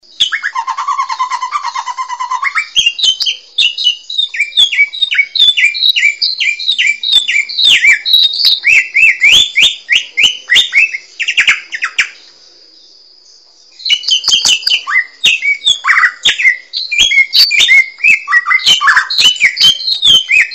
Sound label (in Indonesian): variasi samyong